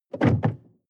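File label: sports car interior trunk open hard; verkehr transport; autos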